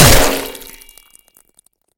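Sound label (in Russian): размашистый удар с растекающейся жидкостью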